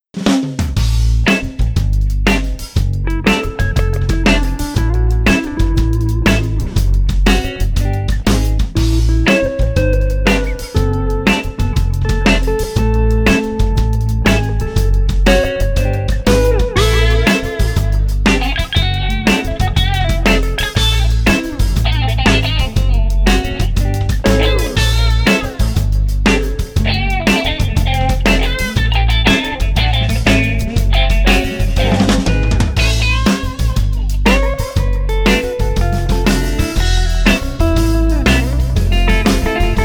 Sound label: Blues Rock Smooth and Cool 117